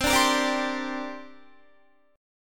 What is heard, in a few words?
C 7th Suspended 2nd, Guitar; C7sus2 strummed